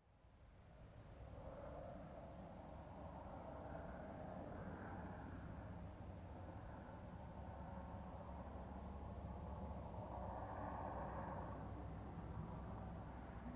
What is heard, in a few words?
Car approaching and parking